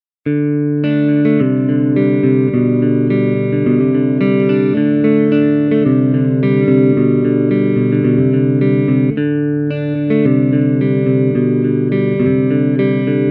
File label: PAF Neck